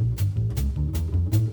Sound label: bass; Phonky